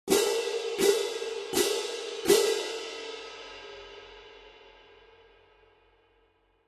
18 K Constantinople Vintage Orchestral Medium Light Pair, cymbal